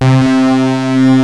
REZZ BUZZ, Peavey DPM; Rubber Chicken Software Sample Bank

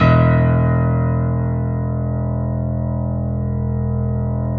PIA HIGH G, Grand; Sound & Vision; Gigapack I CD 2; PIA GRAND 1

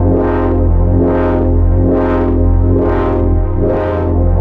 texture saws looped; Polyend; Recue

